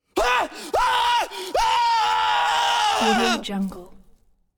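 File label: group trauma scream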